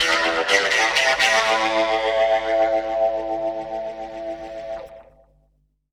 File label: Squeeze Guitar; Zero-G Smoke